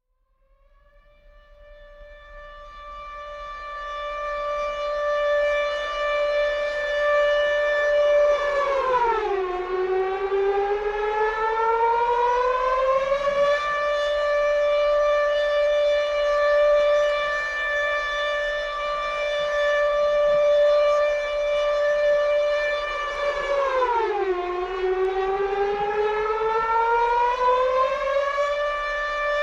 Air Raid; 100 sound effects

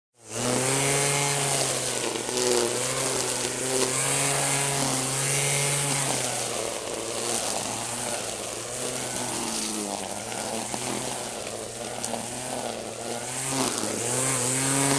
Strimmer; 100 sound effects

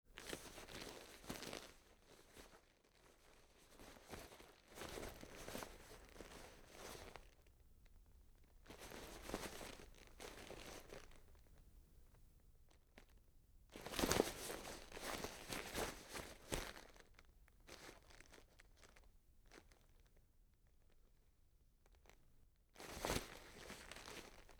Leather Jacket Movement Rustle Scrunches; Foley - Props; Foley Essentials

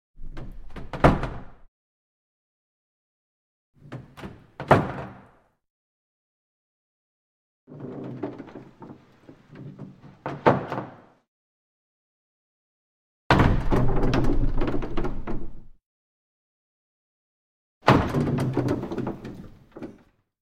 Cupboard Open & Close Dry; Hollywood Edge Historical Series; cd4 Castles Palaces & Monasteries

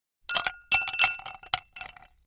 Ice Clinks in Glass Without Liquid

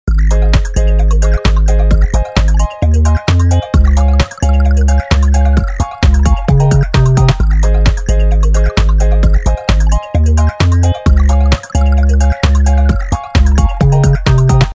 iKaossilator loop

